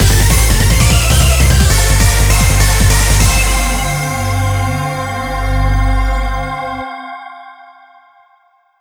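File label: Jingle Victory; Azure Striker Gunvolt Striker Pack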